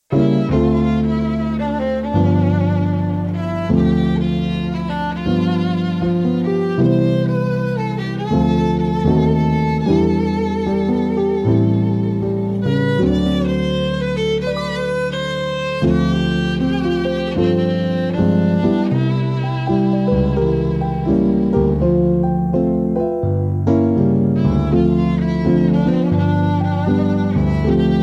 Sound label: Violinist